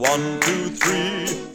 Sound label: 1 2 3 SUNG; numbers and letters